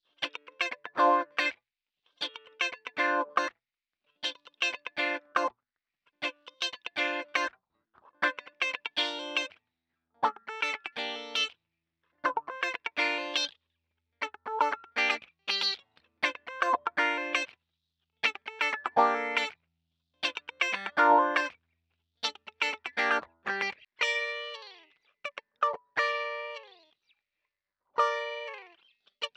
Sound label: Guitar loops wah-wah Gm